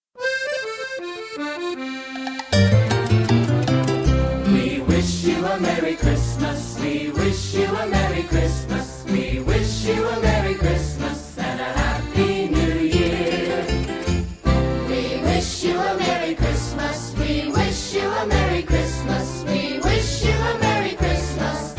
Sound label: no ambience